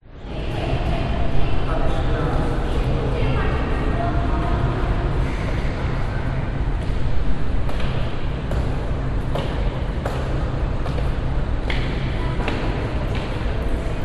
public places city hall foyer ambience people talk climb stairs loud echo; brisbane